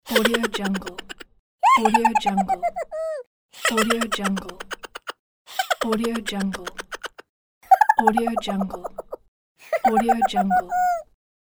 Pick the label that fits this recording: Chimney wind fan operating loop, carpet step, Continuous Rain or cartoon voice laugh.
cartoon voice laugh